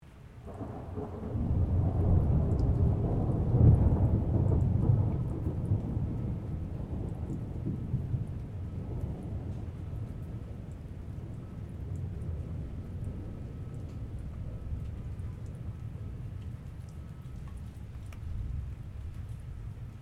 THUNDER MEDIUM WATER DRIPPING STEREO, Severe hail storm